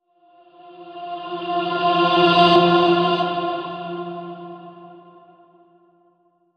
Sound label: Heavenly Voices Reverse